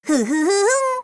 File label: Humming; Hyrule Warriors Definitive Edition; Toon Link's Humming